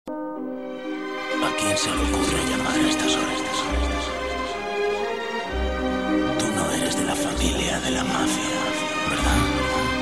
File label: vocal remix